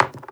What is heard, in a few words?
dice put down